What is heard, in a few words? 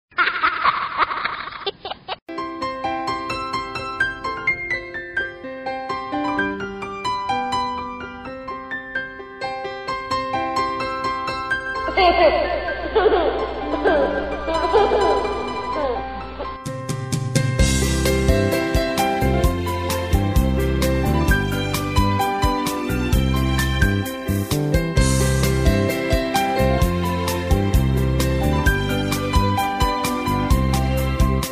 baby chorus, Funny baby ringtones